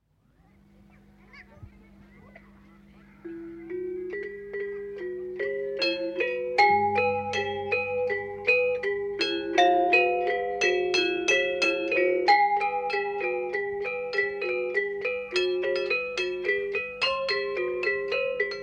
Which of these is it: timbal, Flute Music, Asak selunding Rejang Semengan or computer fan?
Asak selunding Rejang Semengan